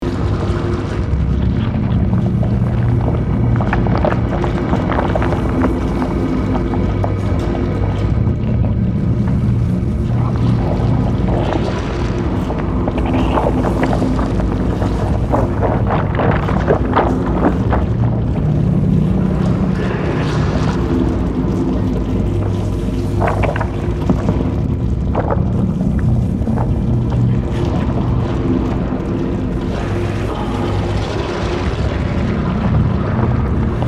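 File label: Ambience Corruption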